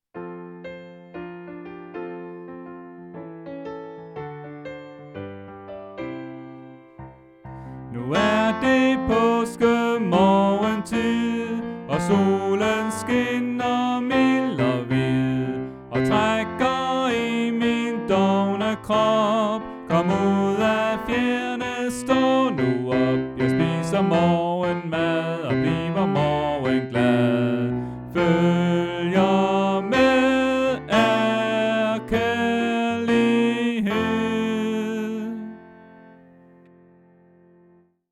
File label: Tenor; oevefiler